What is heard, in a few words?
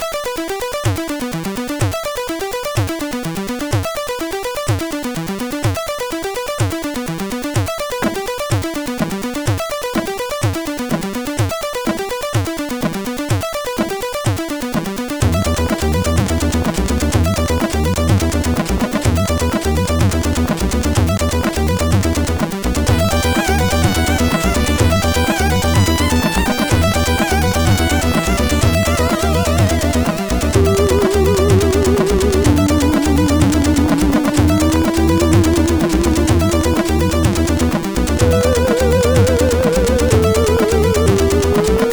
Intro music, MOS6581; MCG Intromusic; MOS6581R4; hvsc; FAME; Hendriks Michael